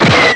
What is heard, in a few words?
blaster